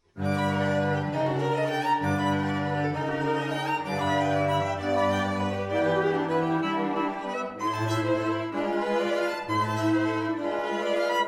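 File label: string trio; herrmann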